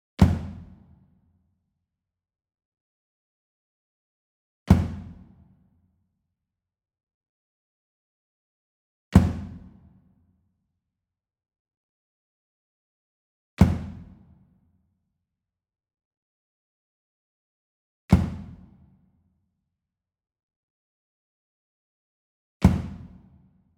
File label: SMALL DRUM Kick Drum Hard Hit Medium; boom library; Cinematic Strikes Construction Kit